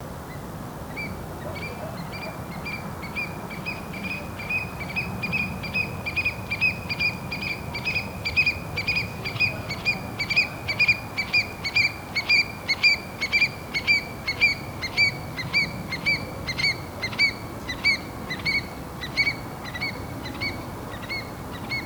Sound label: Eurasian stone curlew